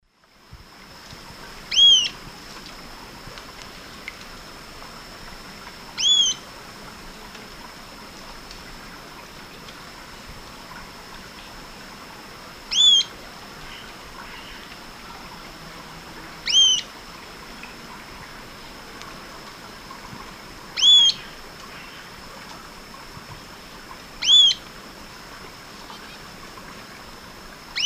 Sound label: Ochthornis littoralis, calls; S. America; Eastern Lowlands